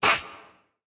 SFX Necromancer Hit Vo; Android; Portal Quest

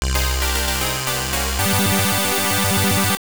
Start Music; dragon buster; x68000

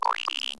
Creatuer

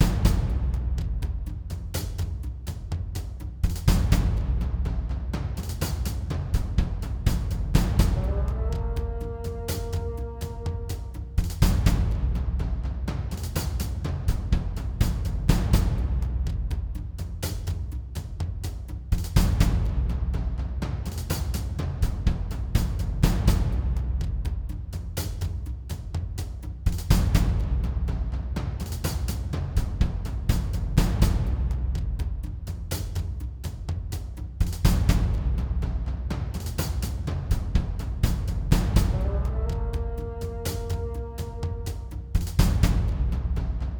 Base Loop

